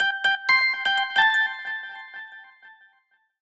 Sweet, notifications; Tinno; ATT Radiant Core

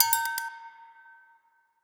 Cowbell, notifications; Google Pixel 4; Reality Bytes

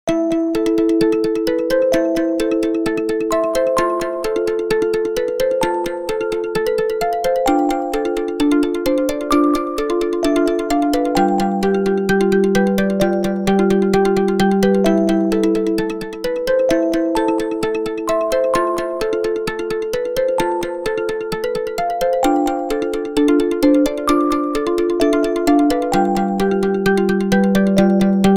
Thoughts Echoing, alarms; Gionee; S10

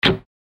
roulette, Games; GD510 Pop

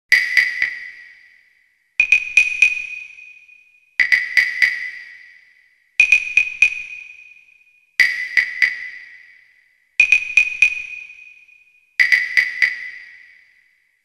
Ring Woodblock; HTC S740

